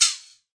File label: Processed Ethn Perc, Doru Malaia Percussion